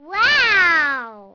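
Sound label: girl wow; Microsoft Graphics Studio Greetings; Microsoft 1998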